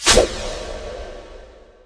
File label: sleep dart cast; weapons; dota; riki